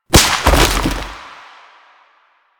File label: Bunraku String Drop; Warframe; Koumei